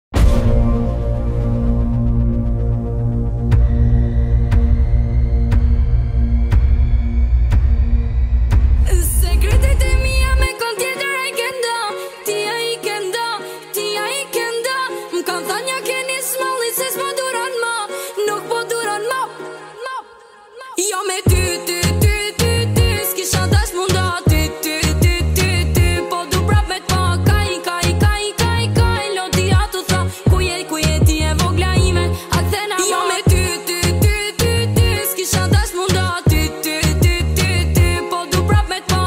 bass boosted music

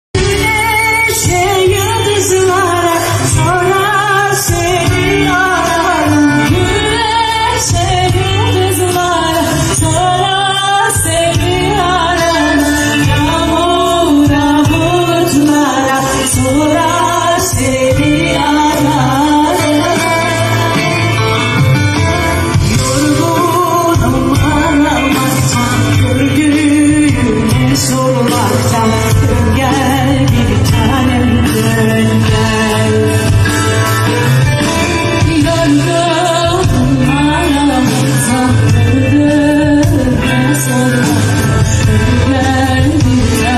duet

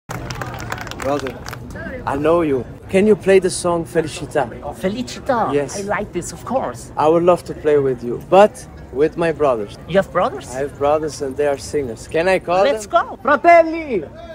cello

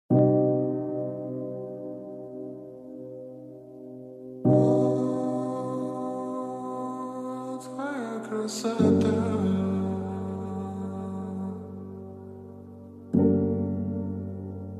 slowed reverb

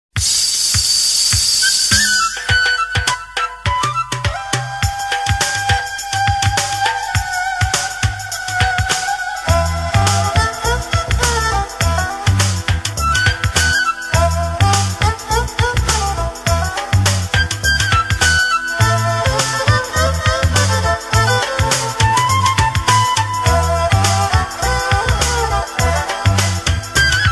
jingle; super fm

